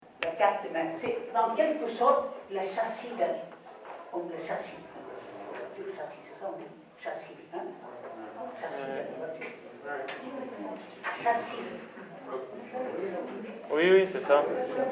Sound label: chassis